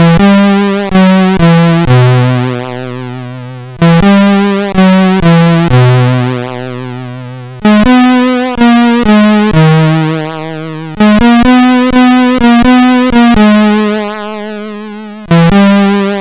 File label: Melody, sid CSG8580R5; HVSC; 3-Tunes